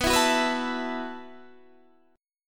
B 7th Suspended 4th B

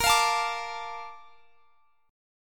Bb Major 7th, Bb

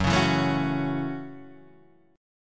Gb Minor 7th

